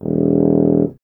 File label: BRS TUBA, Solo Instruments